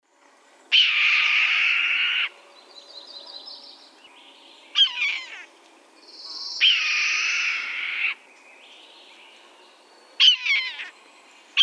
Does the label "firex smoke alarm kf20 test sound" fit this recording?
No